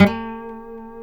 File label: B 3 HAMRNYL, HAMMER NYLON, Guitars